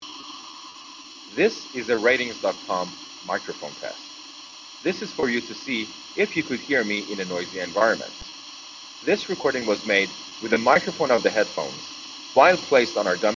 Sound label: active pink noise; elite 4